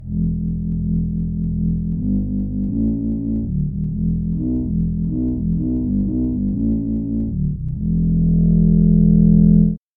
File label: oboe bass samples